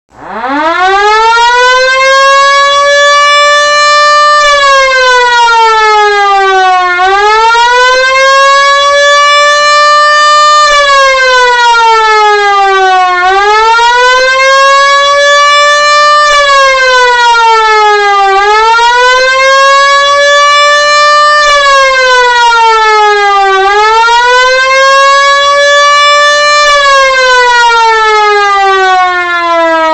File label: fire siren